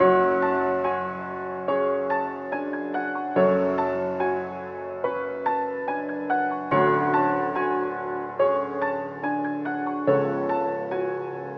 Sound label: 143 BPM F# Min, Melody, Trap; Cymatics; 2022 Melody Collection; Trap Pt 2